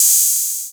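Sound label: 808 open hi hat; Wizoo - Platinum 24 Electronic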